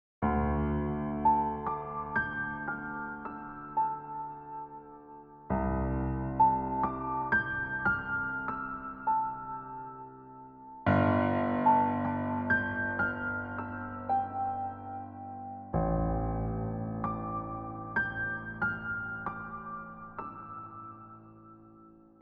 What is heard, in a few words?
PIANO CHORDS SIMPLE; PROOF